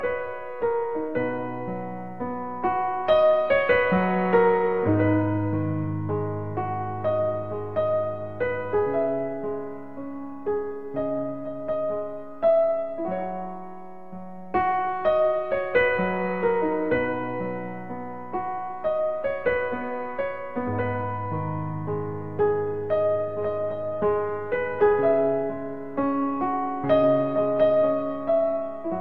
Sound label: piano cover